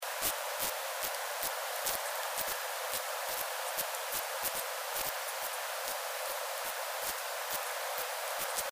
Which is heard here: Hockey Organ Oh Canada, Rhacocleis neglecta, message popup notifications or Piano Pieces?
Rhacocleis neglecta